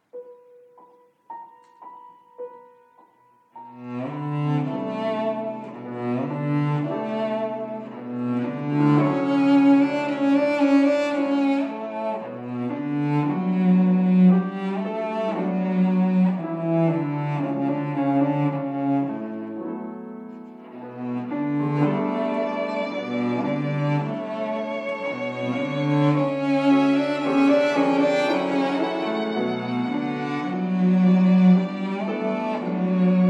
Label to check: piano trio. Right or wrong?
Right